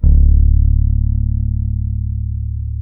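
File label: D#2, French fretless bass; Spectrasonics; Bass Legends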